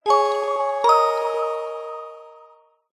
multimedia game sound kids warm mellow positive award bonus